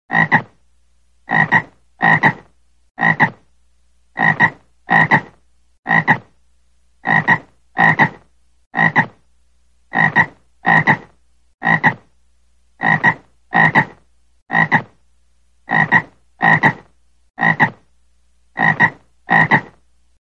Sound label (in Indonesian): Suara Katak